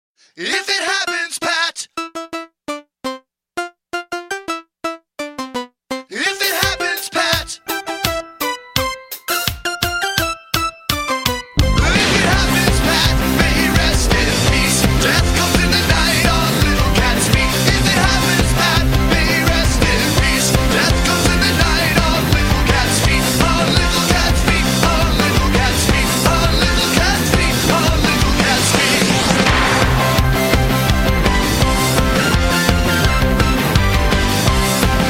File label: Groove